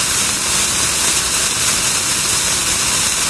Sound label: fuse burn; XDM; ambience